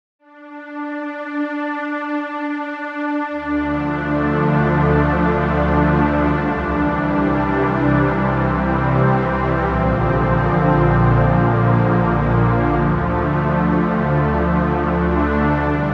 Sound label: supersaw, sampler